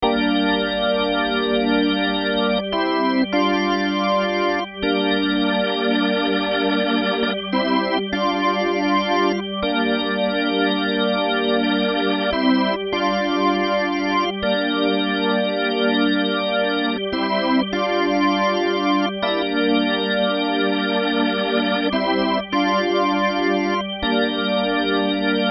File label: Gm Rock Groove; admiralbob77